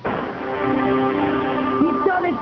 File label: win